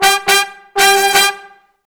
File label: BRASS LICKS, Disco Fever